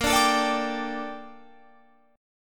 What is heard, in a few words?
A sharp Major 13th A sharp Guitar Open F; strummed